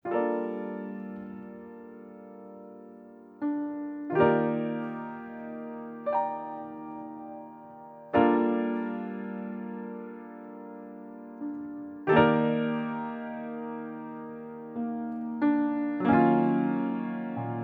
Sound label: ~Piano Arrange Ver1.~; pp pianissimo ayatsuri ningyou no rinbu original sound track tonality